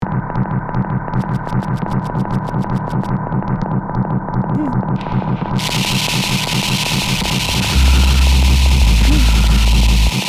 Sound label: Grunt, Callsource